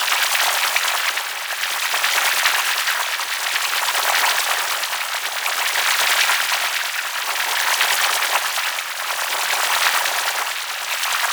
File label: Stadium Hazard Laser Beam Loop; Gameplay; Warframe; Nineteen Ninety Nine